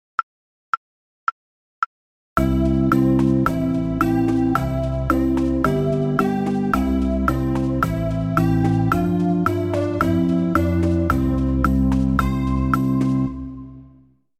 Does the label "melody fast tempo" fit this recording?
Yes